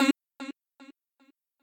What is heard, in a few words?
voice